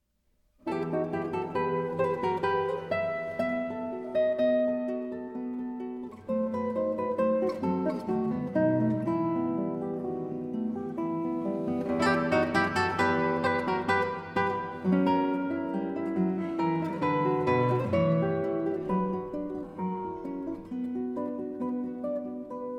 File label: Minuet No.4 In E Minor